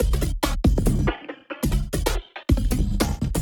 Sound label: Break Beats, 140 BPM